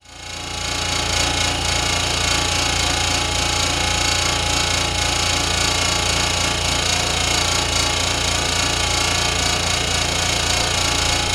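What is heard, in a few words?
refrigerator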